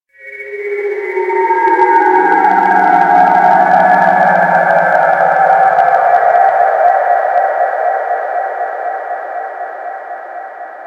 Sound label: Sector Wars Rand Amb, Ambience; Warframe; Tenno